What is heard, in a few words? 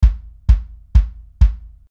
kick drum soundz